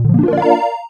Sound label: Level Outro Points End; on a roll 3d